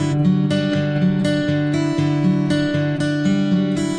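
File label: acoustic guitar picking, 120 bpm; GUITAR LOOPS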